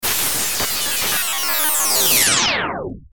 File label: Digital Readout Power Down Distortion; Digital Sound Effects; Production Element Imaging Element Accent Transition